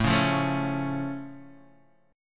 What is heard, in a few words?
C sharp Augmented, A